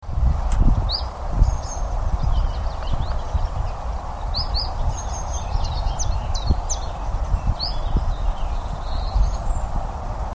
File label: sweeoo sequence